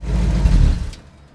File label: buick off; Mafie 1